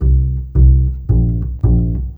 Bass Pizzicato, Orchestral, Rock Pop; Sonic Foundry (Sony Creative Software); Orchestral Series VOL-4; Rock and Pop; Rock-Pop 13

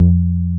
BASS 1; Hip Hop; E-MU Formula 4000 Series Vol. 1; Hip Hop Nation; Big Bottoms

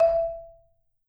MMED F E4, Marimba; MRMBA SPLIT

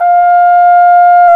BRS, Cornet; Roland L-CDX-03 Disk 2

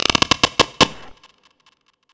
Sfx, Trance; Zero-G Trance Inducer; General Sfx

